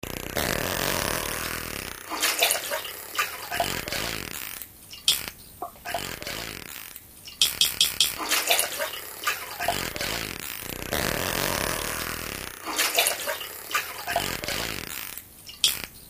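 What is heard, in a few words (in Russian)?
щелканье дельфина всплески воды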